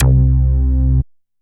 E-Mu Proteus FX Pulsar C2

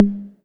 M Audio Venom Low Conga

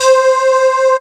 Casio CTK-611 Calliope C5

